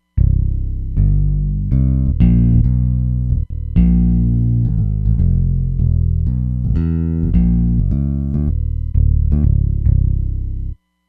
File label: X 5String Bs